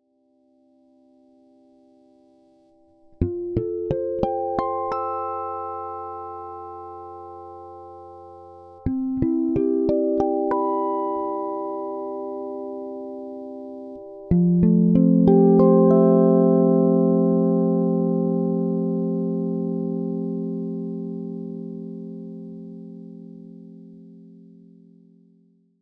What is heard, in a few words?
guitar open 5 limit